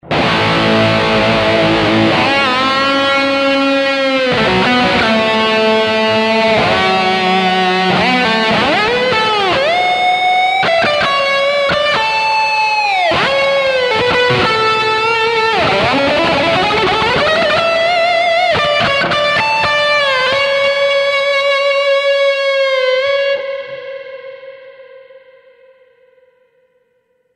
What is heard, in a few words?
Eventide MicroPitch Blue Highs